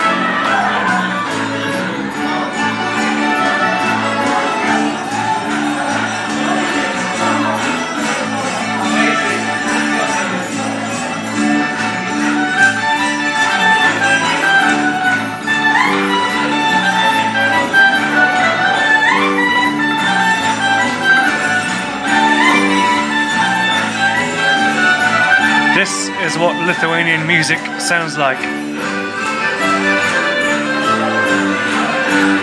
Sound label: lithuanian music